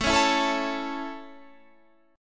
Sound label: A Major A, chord